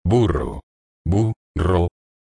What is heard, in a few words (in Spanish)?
Burro